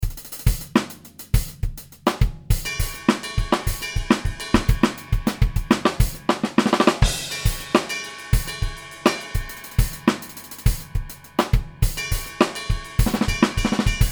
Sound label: Fusion